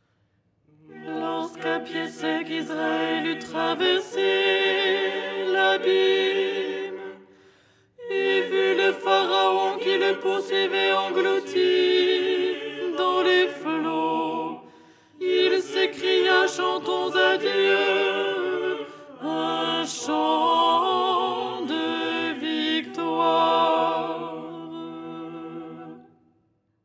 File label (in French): alto; 1er hirmos du canon du dimanche; Les 8 tons en français; ton 6